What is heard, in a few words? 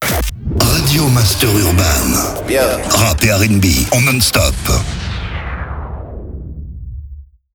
JINGLE RADIO; RADIO MASTER URBAN; Habillage